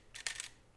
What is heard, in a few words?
Taking Photo With Camera